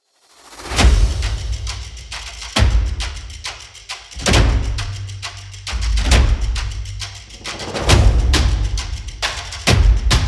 drums; MIUI12

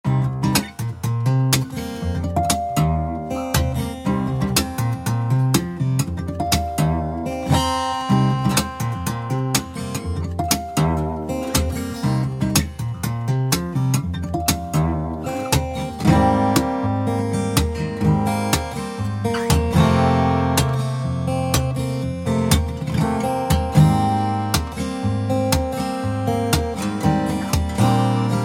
Joyful Acoustic Main Loop; MIUI14 and HyperOS 1

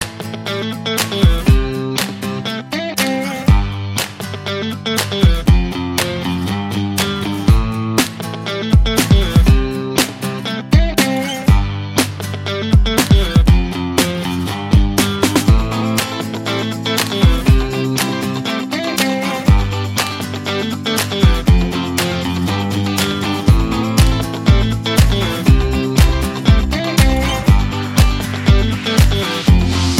Upbeat Funk, RINGTONE

